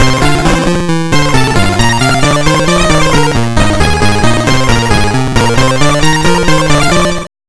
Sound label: Level Complete